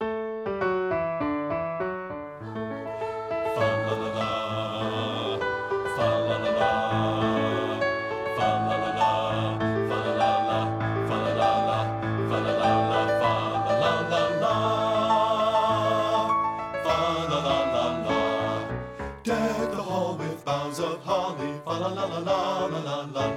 bass; satb

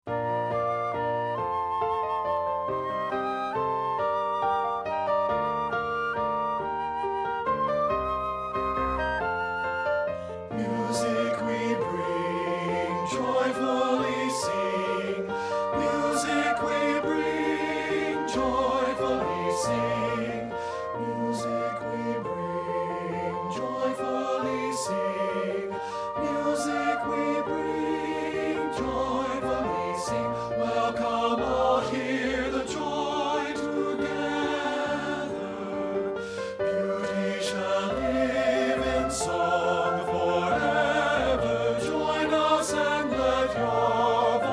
MUSIC WE BRING PT3; partbypart

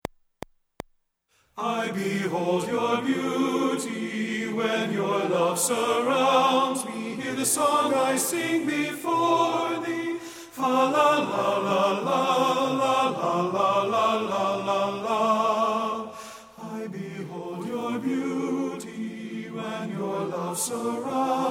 TENOR; satb; partbypart

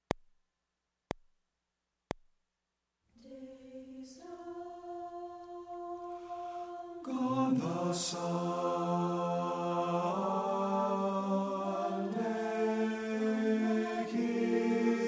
TENOR; satb

